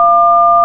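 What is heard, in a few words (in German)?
Tonwahl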